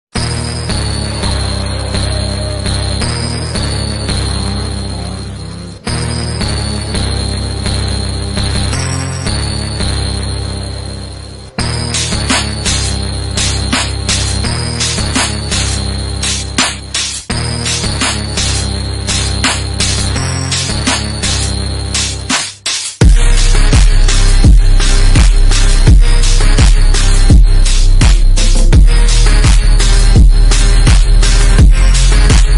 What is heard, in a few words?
super slowed reverb